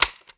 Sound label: Button